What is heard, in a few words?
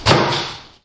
hit